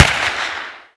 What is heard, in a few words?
cannon; viper